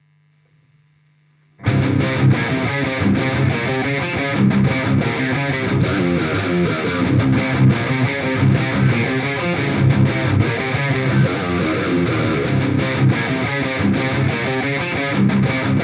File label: Reamp Test Poweramp